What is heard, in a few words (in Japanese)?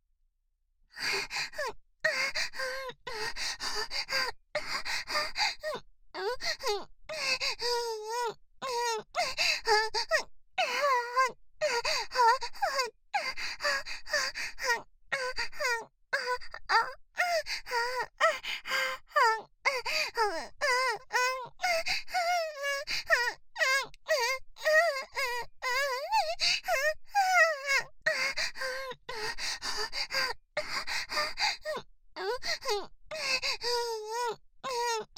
喘ぎ声リピートボイス; NTRかのんちゃん